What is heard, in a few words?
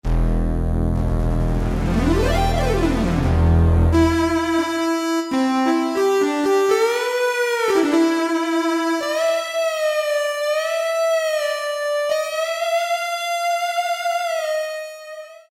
Sync, Yamaha TG33; Factory Presets; Preset bank 1